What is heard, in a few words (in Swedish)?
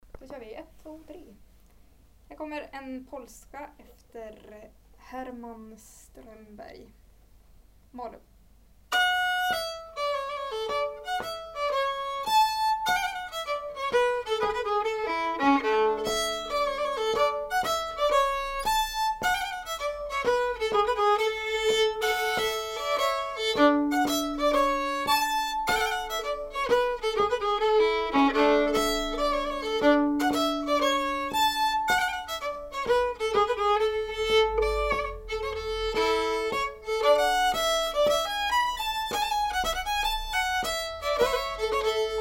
Polska